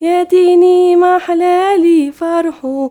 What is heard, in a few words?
YOUNGIRL, Arabian Traditions; USB Soundscan vol.43; AKAI